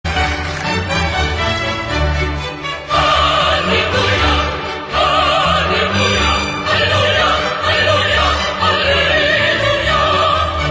aleluia

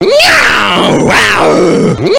grito da onca

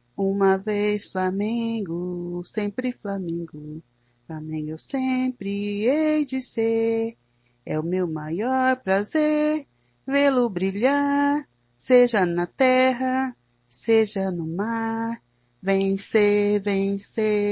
hino do flamengo

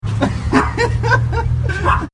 risada

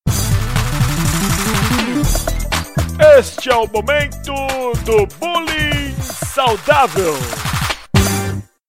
vinheta; momento do bullying saudavel

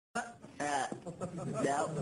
ahhhh

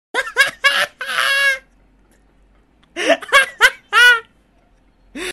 risada

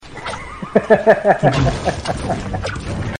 risada

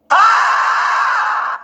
grito; tiktok

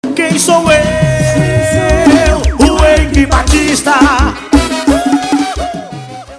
forro estourado

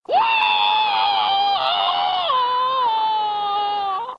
grito; liu khan

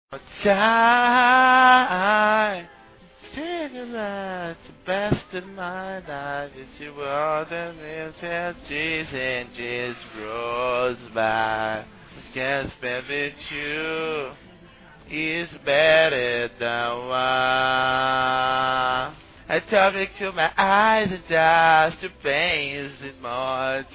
cantando

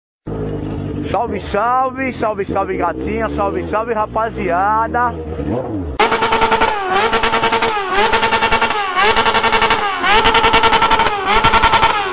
som para toque d cel

